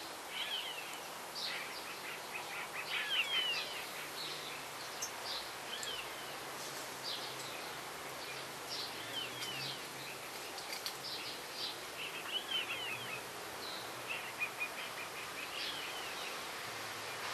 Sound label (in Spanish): Taguato en altura; Chajari